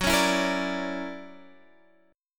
Fsharp 7th Flat 13th, guitar chord

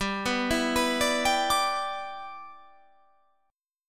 G 6th

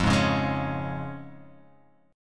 F Minor Sharp 5th F down

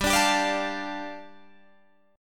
G 7th Suspended 2nd, Guitar DADGAD

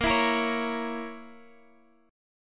Bb Diminished Bb Guitar Half Step Down

